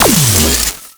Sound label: Antimatter Drop Fully Charged; Warframe